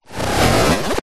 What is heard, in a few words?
science fiction robot or computer shut down glitch